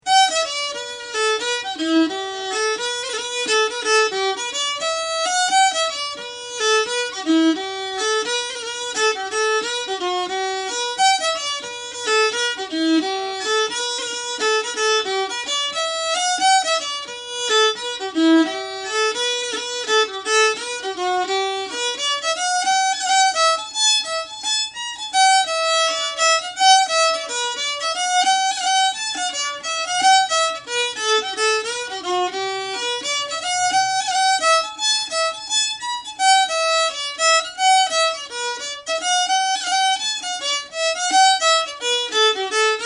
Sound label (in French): Boys of the Town (violon)